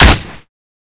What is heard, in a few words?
fiveseven silenced; weapons